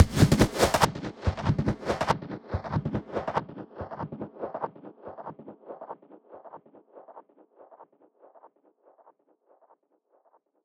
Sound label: Froztal A# 142 BPM, Psytrance Zaps & Filter Sweeps; Ghosthack; Psytrance Visions Volume 2